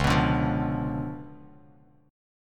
C sharp 7th Flat 9th Bass Standard, chord; C#7b9; strummed; JGuitar

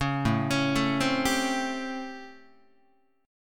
A 7th Sharp 9th, Guitar; A7#9; arpeggiated; JGuitar

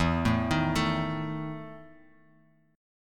E Major 7th Suspended 4th Bass Standard; arpeggiated; JGuitar

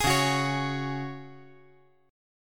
Db Suspended 4th Db; strummed

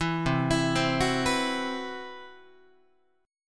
C Major Sharp 11th, Guitar; CM#11 arpeggiated; JGuitar

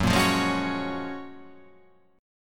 F sharp Major 9th

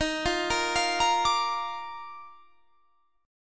D sharp Major 7th Suspended 2nd; D#M7sus2; arpeggiated

